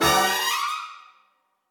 Flashover

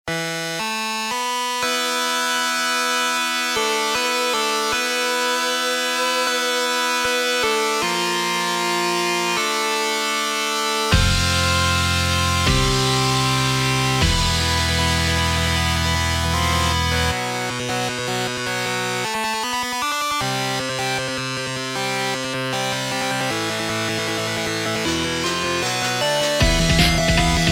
8bit; raven nest

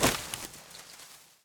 foliage hit

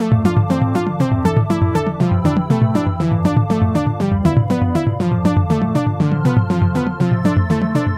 Electro

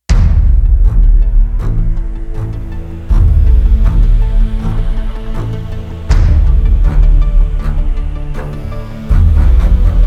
COMBAT TENSION

